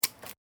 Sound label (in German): Pflanzen schneiden